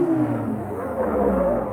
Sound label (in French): LOOP Frotte Grogne Mono